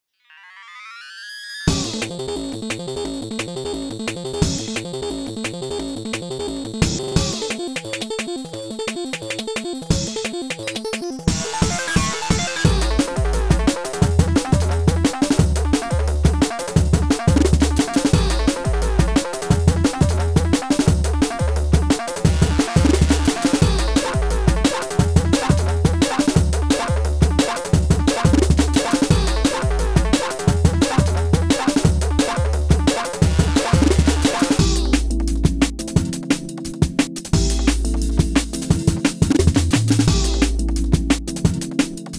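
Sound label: instrumental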